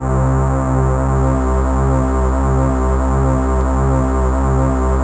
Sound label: SYN, ENSEMBLE